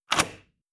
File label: Room Door Lock